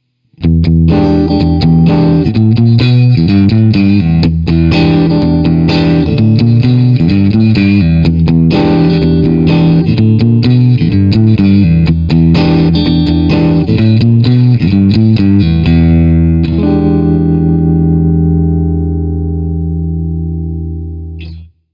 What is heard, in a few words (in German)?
Halspickup und Mittelpickup